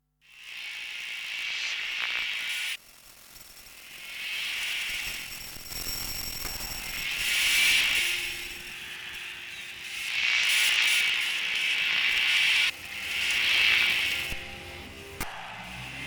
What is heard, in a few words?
buzzing distortion